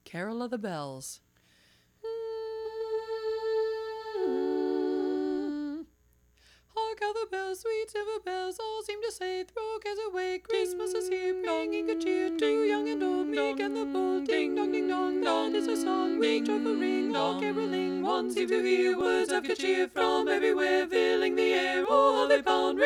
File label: Carol Bells bass missing; Bells bass omit